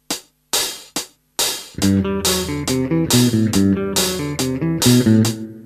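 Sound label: natural minor scale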